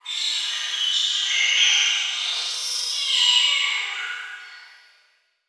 Title Transition Horror Dark; Adobe; Production Element

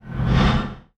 Transition Whoosh Short; Production Element; Title Transition; AdobeCC

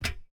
FOOTSTEP - Metal Grill Walk Barefoot Male; Barefoot on Metal; Timothy McHugh; GDC Game Audio Bundle

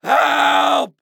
Human Male Yell Help In Pain

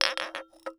Household Glass Rattle Two Glasses

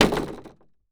Impact Wood Drop On Wood

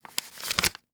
Foley Money Bill Movement In Hand

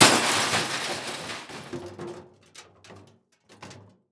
Car Crash With Little Glass; Crashes; Crash Impact

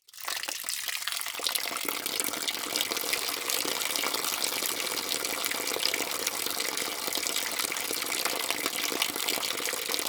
Liquid Water Water Filling Tub

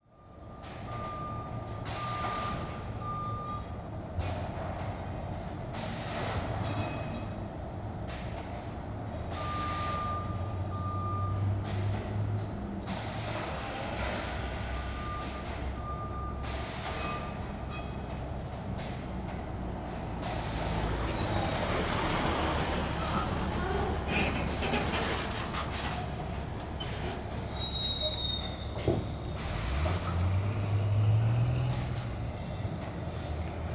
Ambience Factory Entrance Door Open Slightly 180; AdobeCC

